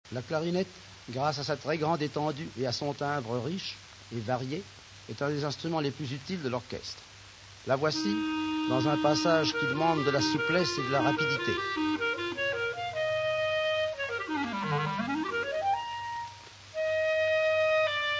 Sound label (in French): orch clarinette